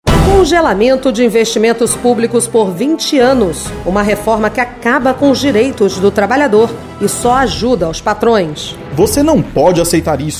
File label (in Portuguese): Spot Marcha